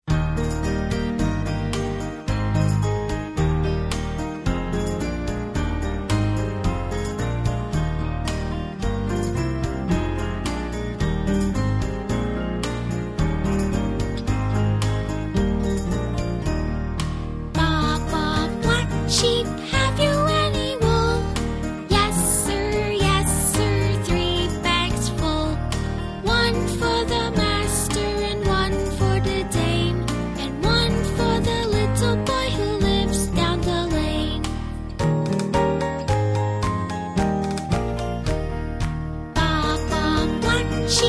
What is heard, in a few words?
Baa Baa Black Sheep